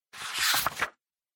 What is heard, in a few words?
Book Flip